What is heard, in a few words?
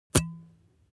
object bottle glass drink stop soft